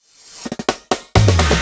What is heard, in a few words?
Fills 128BPM, Clubsounds